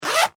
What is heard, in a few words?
Foley Zipper Open Large; Zippers; Objects